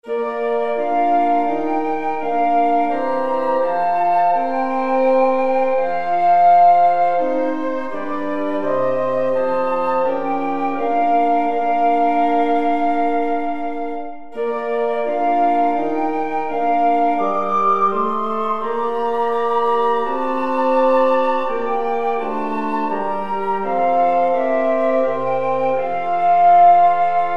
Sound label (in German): blaeserquintett